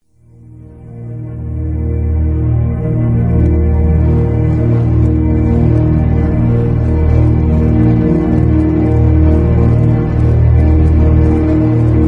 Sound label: instrumental; Rain Forest